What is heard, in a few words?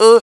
FEM PERC, Vocal Jazz FX